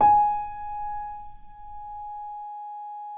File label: PNO PP; Synclavier Sampler Library